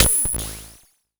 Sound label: Cwejman S1 - Series Hat